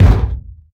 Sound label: verby steel kick drum b key